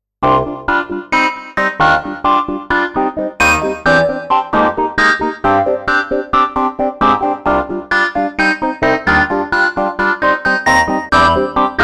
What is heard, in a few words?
Comp Sync